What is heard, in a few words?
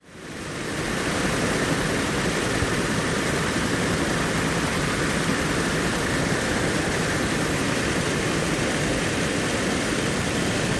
UH1 Huey Hover Heavy